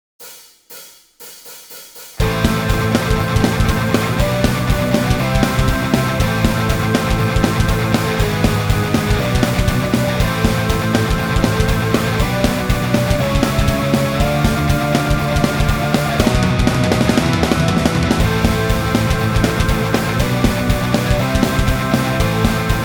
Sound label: backing